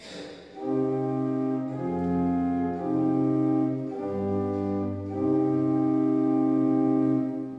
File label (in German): kadenz moll